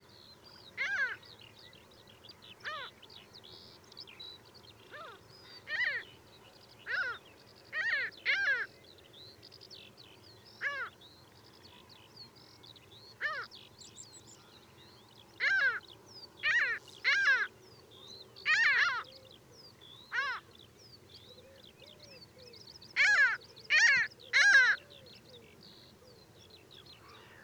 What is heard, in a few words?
flypast